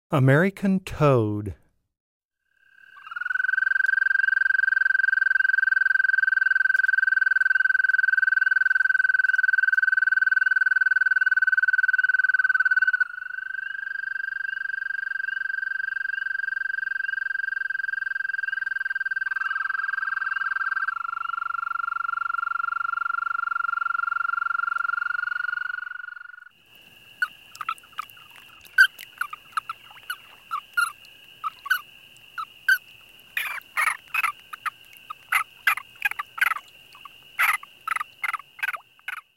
American Toad, Wildlife Sounds

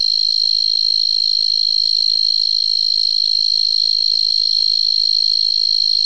Oecanthus nigricornis; Arthropoda

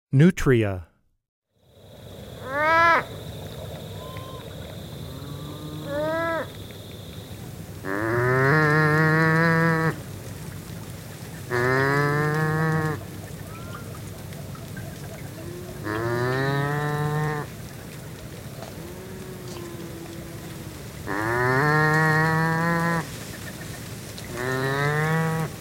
Nutria, Wildlife Sounds

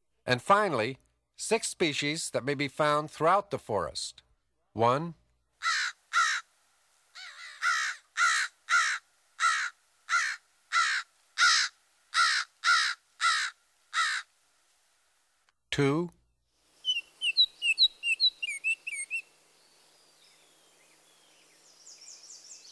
Throughout Forests, Birds; Birding By Ear

